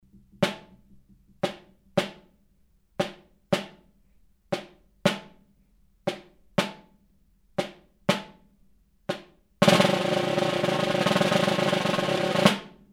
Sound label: snare